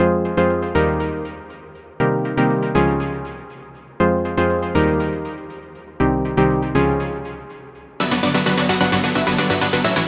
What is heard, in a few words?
Synthwave